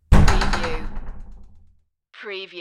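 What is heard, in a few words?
Door Bust Open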